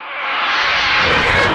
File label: Elephant Screech